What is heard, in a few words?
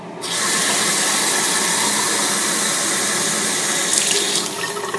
turning On Water